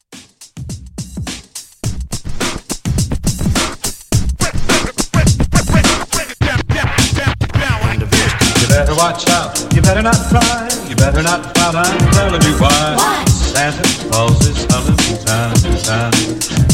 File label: Christmas Re Drum